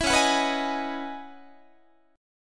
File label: C sharp Minor 11th, Guitar; C#m11; strummed; JGuitar